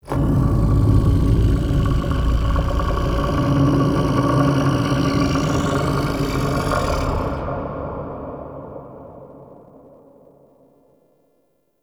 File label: Misc Shouts; Warframe; Man In The Wall; Entrati